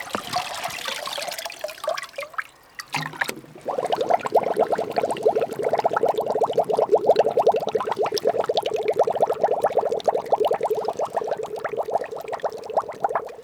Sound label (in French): BRUIT glouglou